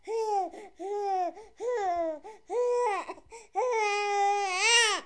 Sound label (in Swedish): gråtande baby